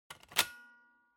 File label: Floppy Disk Drive Eject